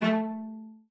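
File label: CELLO spiccato A3; 90 s Sample CDs; Xsample VOL-5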